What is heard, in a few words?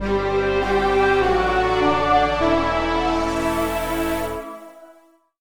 Fanfare; AM2R; HDR HQ in-game music